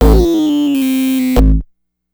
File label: Synth Bass